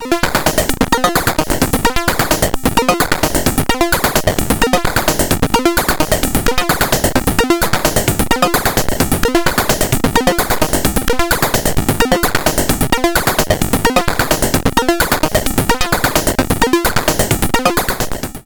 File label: Multi Function Discrete VCO PWM; ACL